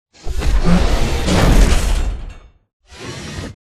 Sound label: melee charge; Titanfall 2